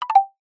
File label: multimedia tone short select three tone wooden